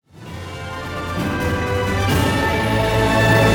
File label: level up